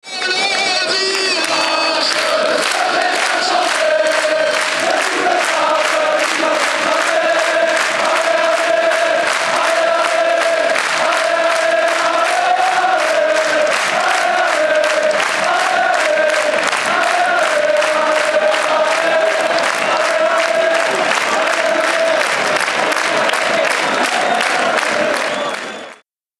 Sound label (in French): Quand le virage se met a chanter c est tout le stade qui va s enflammer; Les chants des supporters des Girondins de Bordeaux